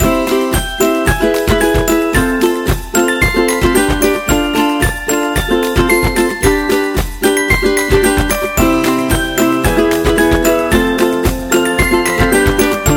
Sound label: Happy